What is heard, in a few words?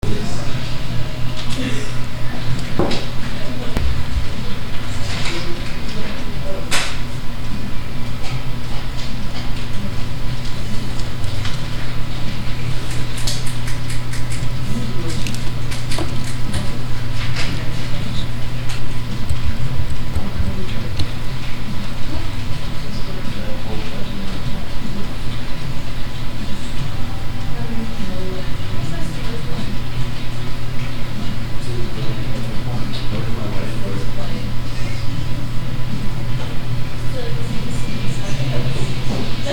Ambient Classroom Mono